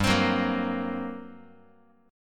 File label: G Minor Major 11th G down Guitar; strummed; x,3,3,4,2,x; 4A,4E,5A,5D,5G#,6C#; JGuitar